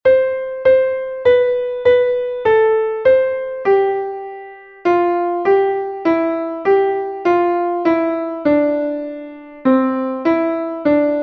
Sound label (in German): klavier; MelodieMeister